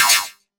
spike trap, traps hazards; freepixel

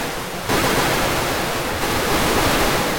minecart ride; Vehicles; mounts; freepixel

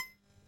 coin collect; Items & Pickups

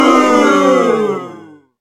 crowd boo; Sports & Arcade; freepixel

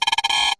frog croak; More Creatures

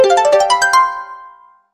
victory; freepixel; Tilter; Sfx

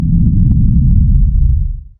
boss intro; Sound Effects; WordJourney

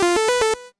quest available, npc dialogue

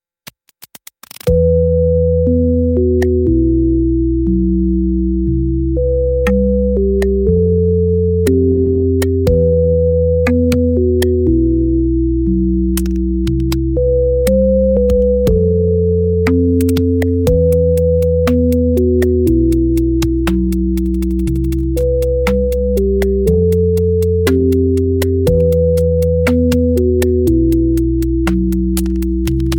ancient ruins; freepixel; Dungeon

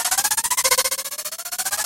wings flap; Movement

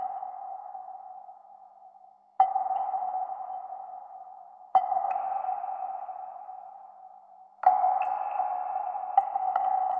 dungeon ambience; Ambient; ambient loops

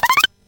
card draw; Cards & Board

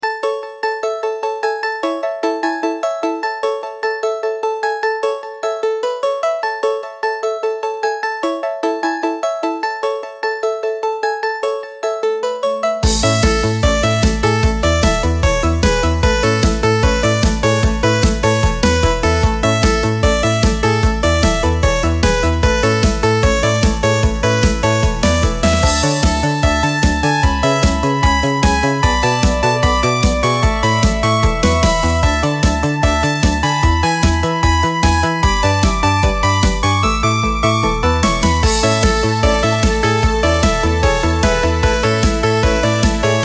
sky castle, music; biomes

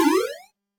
debuff, magic spells

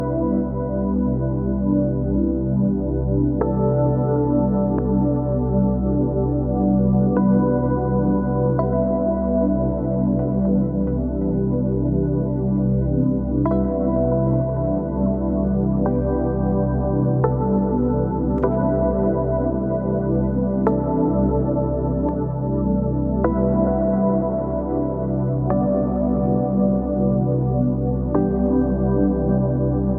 menu music; freepixel; Wordacted

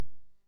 kick; freepixel; Character Actions

